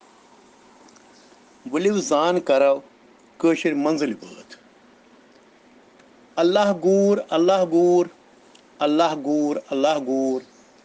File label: Children Rhyms in Kashmiri